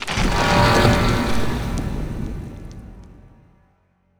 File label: moving out battleship; necroid; Stellaris; necroids